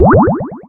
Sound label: bubblegun create; Treasure